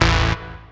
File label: DR. LOOP, BUZZ; X-Static Goldmine 3